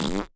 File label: Human Male Fart Short Fart